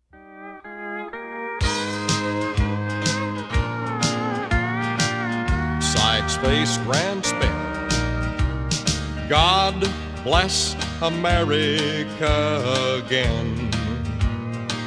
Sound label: vocal, square dance